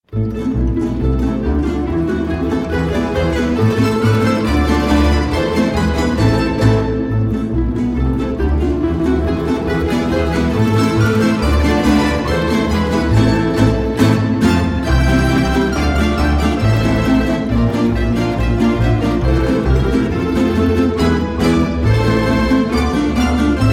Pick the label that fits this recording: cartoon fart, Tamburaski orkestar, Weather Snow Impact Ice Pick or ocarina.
Tamburaski orkestar